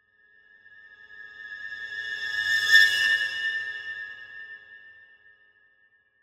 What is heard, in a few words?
Symphonic Hit Jarring Violins Calls - Passing Tones; Hollywood Edge; sound designer's toolkit v2